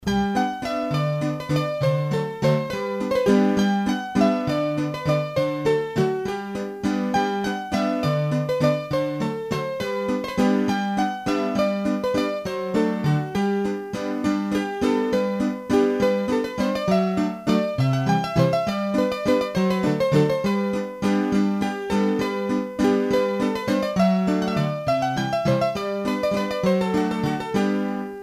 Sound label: Valse, hurdy-gurdy